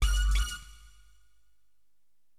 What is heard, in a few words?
STAB, AcidJazz Funk Fx; LiveAct Pool